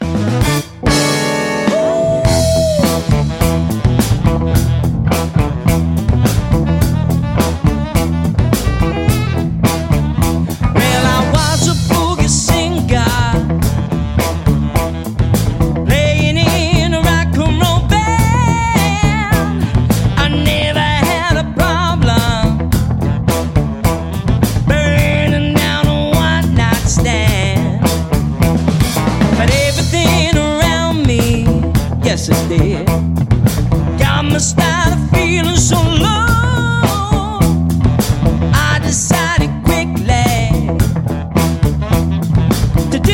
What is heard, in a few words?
Uptown Groove Funky Music